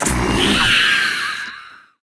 incubers Die; Seer; GamigoNA

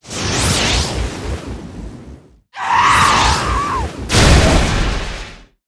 Die; Seer; GamigoNA

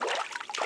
Siren Typhoon Run; Seer; GamigoNA

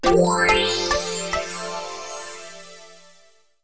Repair Furniture

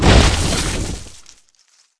Elven Tree Die; Seer; GamigoNA

